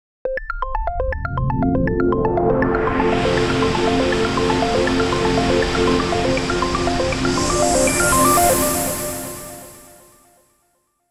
noisy twinkle

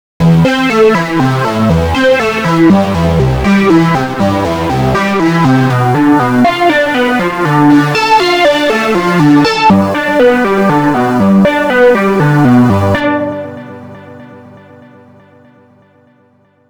sun arpeggio

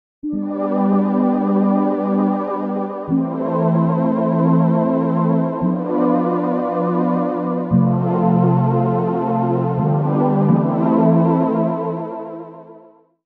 space bees

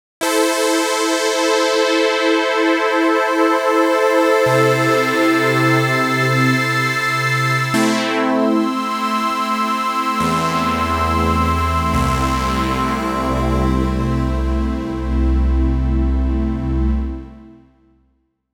bubble

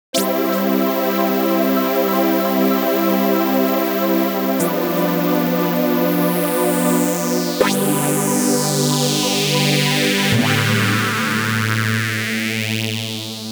ascend

